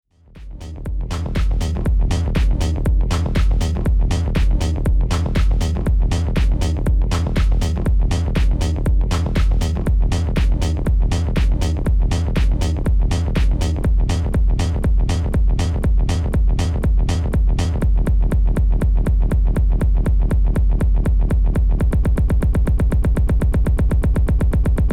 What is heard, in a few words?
Roll; PLX 500